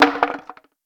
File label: Bone Hit